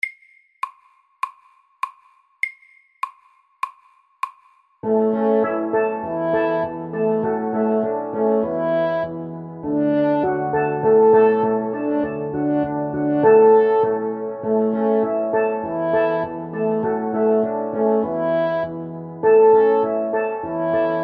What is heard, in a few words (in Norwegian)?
Steel drum band SAKTE